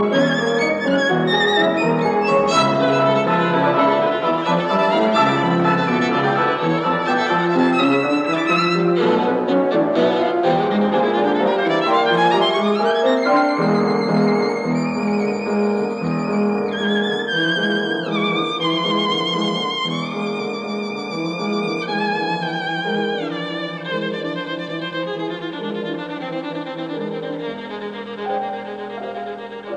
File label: Violin Sonata, Allegro; Porter; Louis Kaufman